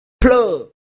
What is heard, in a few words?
pluh